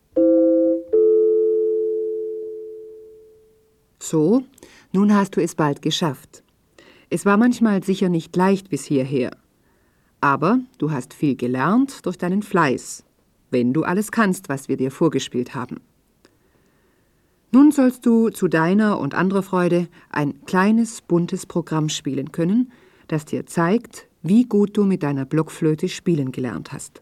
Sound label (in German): Spiel Blockfloete